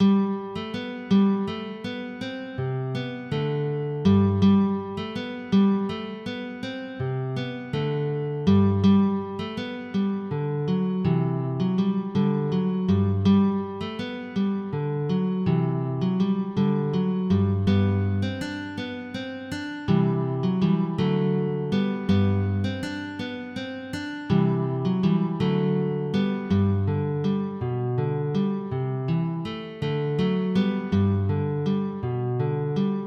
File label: CANARIO, MIDI